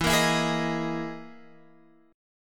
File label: E Major 7th Flat 5th, Guitar, Open F, EM7b5 chord; strummed; x,11,10,11,11,10; JGuitar